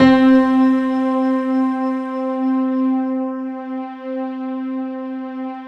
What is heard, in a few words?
piano, Piano+String; Sound & Vision; Gigapack I CD 2